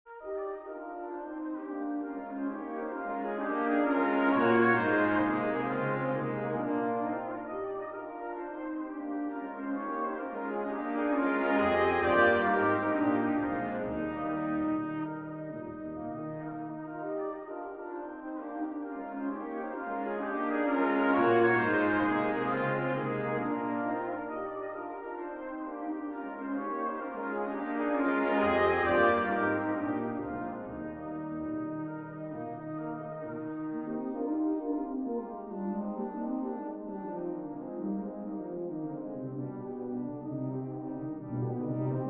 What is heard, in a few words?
Gigue